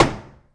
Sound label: Jambl Lofi, EMU Orbit-3 drum machine; 300 Drum Machines